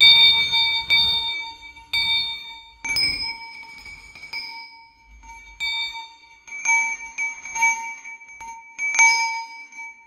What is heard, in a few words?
bells sound effects